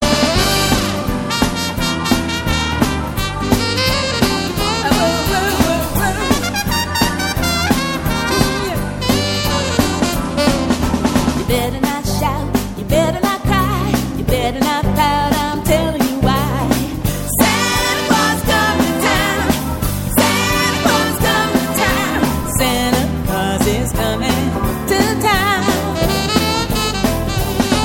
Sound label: jazz